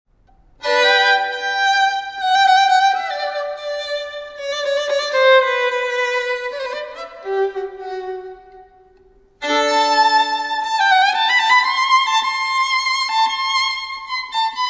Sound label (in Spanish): violin; Sonido del instrumento; Alexander Cruz